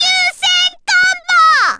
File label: combo burst; Taiko AC0 v4